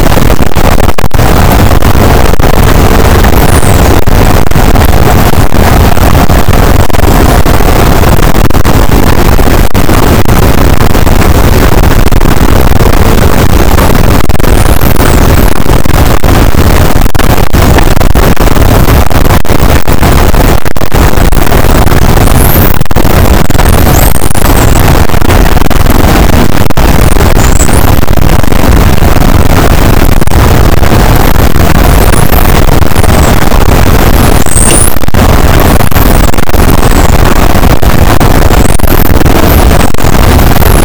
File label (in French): cachalot negatif, boat, Physeter Macrocephalus